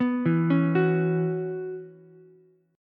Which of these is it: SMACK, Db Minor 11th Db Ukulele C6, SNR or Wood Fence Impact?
Db Minor 11th Db Ukulele C6